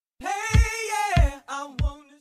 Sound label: notify; deadpool